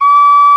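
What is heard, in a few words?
penny whistle, World Winds; E-MU Producer Series Vol. 7 – Old World Instruments; World Combos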